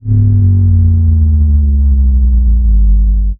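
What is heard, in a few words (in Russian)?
басовый звук для джунглей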